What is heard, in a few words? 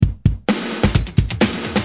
Tight, Drums Perc; Industrial